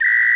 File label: Whistle Lo; Sound Library 2000; Wayzata Technology; Instruments